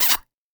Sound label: Classic Miss Sound; Jolly Battle; Android